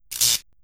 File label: static shock; shmod